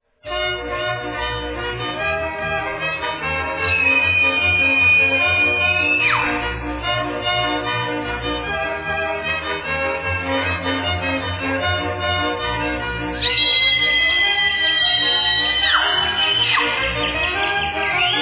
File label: Polka